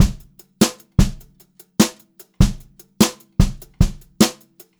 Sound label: JZ BEAT, Brushed Drum Loops; USB Soundscan vol.39; AKAI